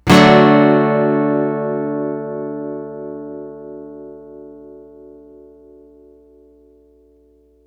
One Shot Guitar - 125 BPM (A Major 3), Acoustic Guitar; Instruments; One Shots; GhostHack; Ghosthack - Free Guitar And Piano Samples